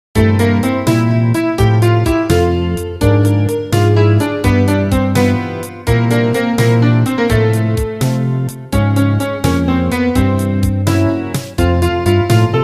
chopsticks

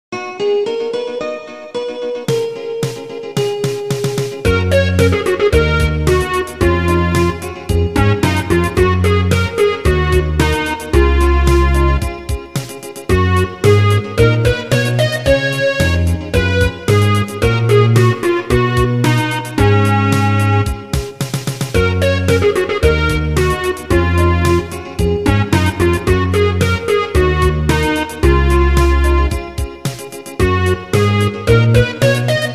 guitar blues

